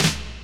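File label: snare, drums; mercure drums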